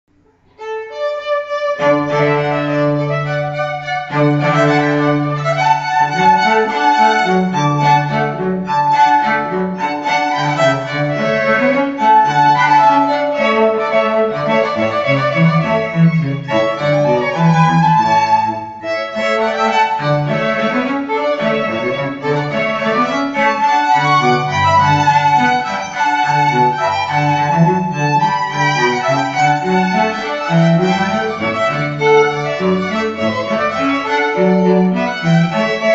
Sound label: trio; La Rejouissance: by Handel trio